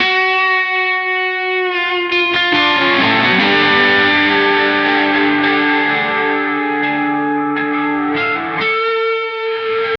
electric guitar

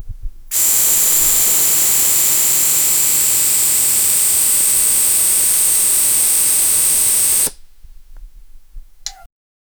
air realease from a vavle

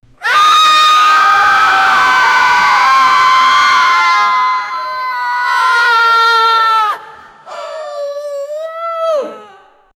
horror movie screams; sound effect

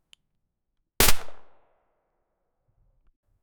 a shot from a pistol, firearm

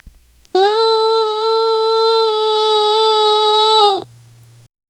very short alert with two

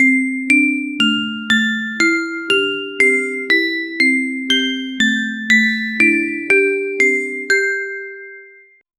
soft inspirational tones, melody

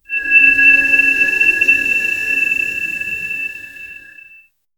a resonant chime layered; ambience

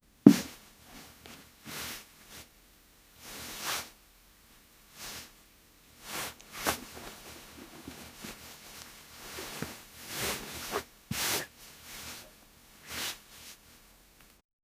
sex slapping of skin bed; bedroom

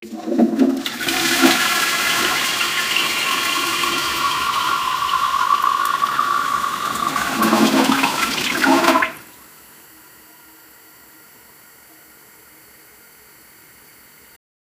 a toilet flushing; plumbing

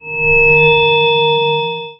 a short sharp attention, stone

